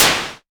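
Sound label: snare, Moog Minimoog Drums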